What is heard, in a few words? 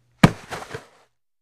Football Grab Hand